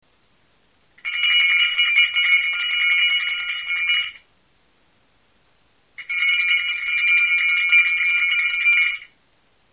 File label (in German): grosse schelle